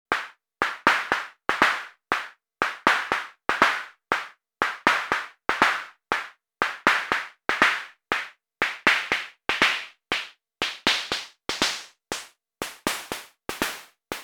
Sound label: Arturia DrumBrute Impact Snare 2